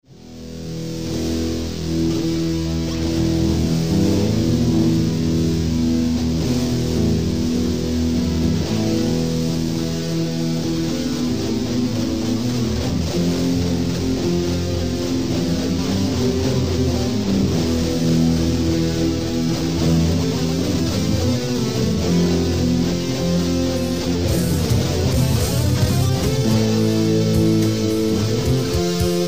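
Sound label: electric guitar old metal riff; un air lent avec un semblant de solo; Enregistrements persos (avant 1990)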